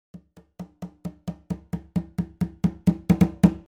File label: Conga Velocity